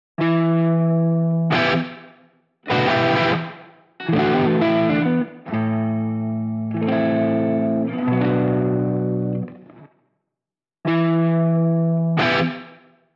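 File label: Electric Guitar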